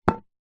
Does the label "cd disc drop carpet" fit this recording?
Yes